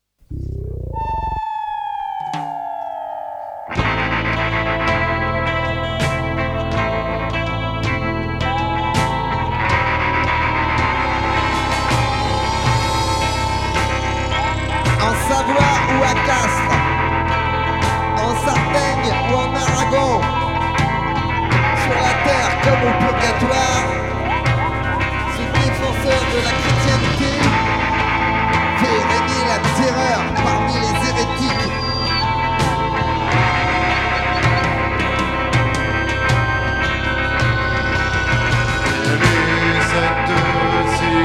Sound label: alternate vocals